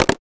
UI Press; FREE SFX PACK VOL 3

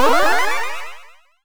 magic

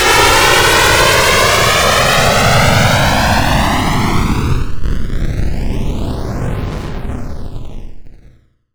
Retro Passing Airplane

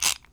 Ratchet Muted; Smol Mechanisms

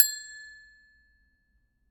Bell Ring; FREE SFX PACK VOL 2

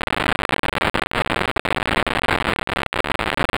Retro Electric LoFi

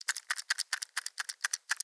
Plastic Dice Toy Shake

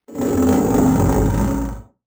Retro Roar Krushed

